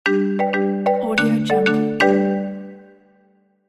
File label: win game level marimba